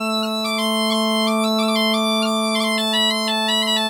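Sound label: bagpipe 90, Celtic Flavours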